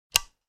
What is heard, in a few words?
light switch